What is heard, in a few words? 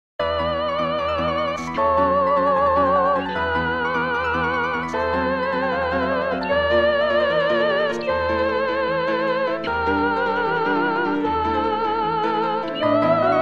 Soprano